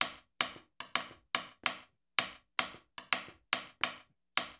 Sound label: LOOP, Percussion